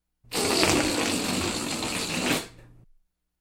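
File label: Balloon deflating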